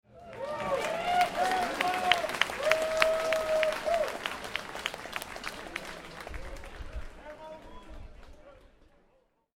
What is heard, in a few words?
Applause With Cheering, Medium size crowd, rowdy, outdoors.; Applause Sound Effects